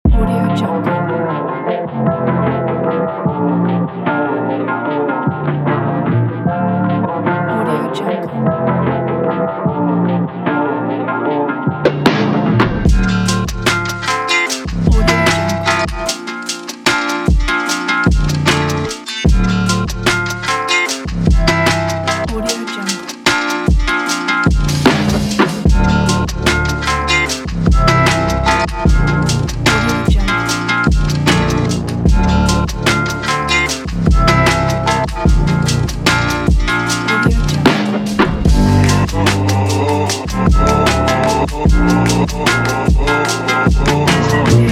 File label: abstract vlog